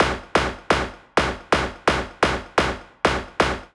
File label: 128 C Synth; Fired